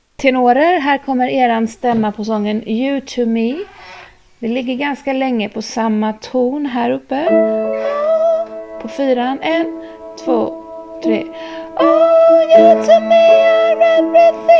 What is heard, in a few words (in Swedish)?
you to me tenor, stämmor